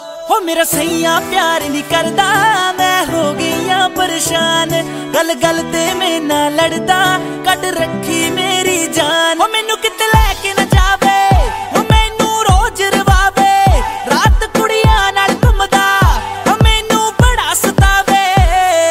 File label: punjabi ringtone